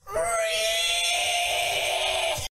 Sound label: reeeeee; pewdiepie